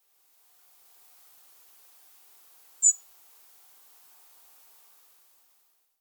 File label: Erithacus rubecula; SETE